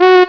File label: HI TROMB